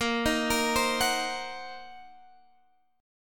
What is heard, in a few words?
Eb Minor 6th Bb, guitar chord